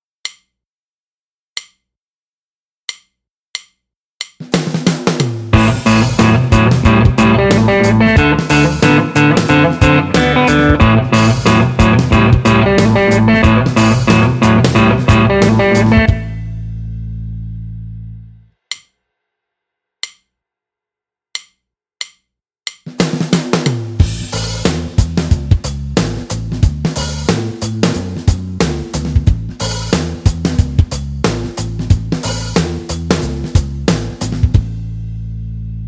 Build Up

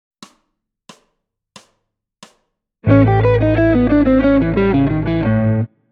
blues

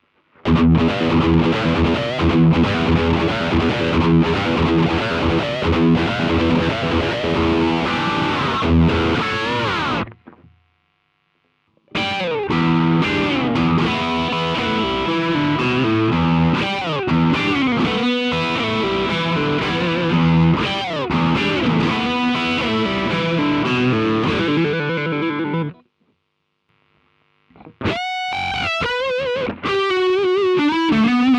distortion

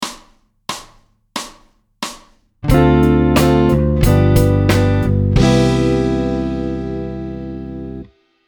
chord

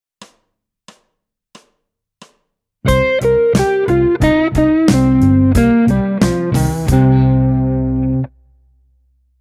blues

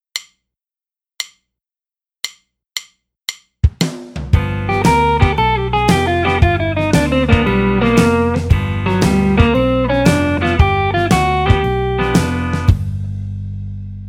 Build Up

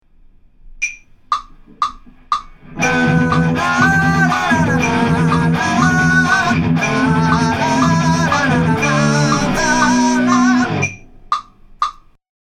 vocal

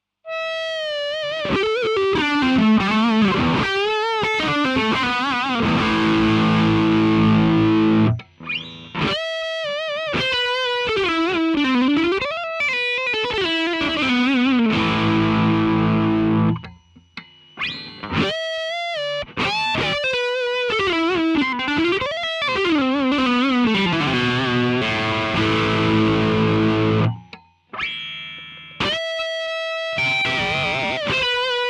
Rails Drive Front

